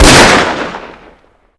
ssg3000 fire; firearms

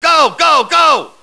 police noise; svencoop

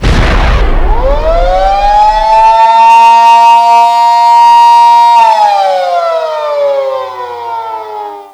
nuke; wpnmod

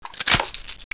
m60 box in; firearms

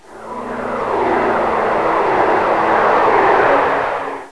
windmill; svencoop; teletubbies

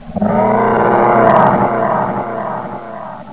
eyeball cry; svencoop; bluecell

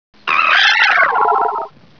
headcrab die; gearbox

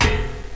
ladder

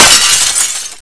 bust glass, debris

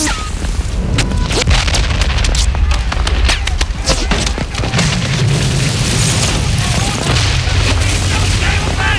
force amb; firearms

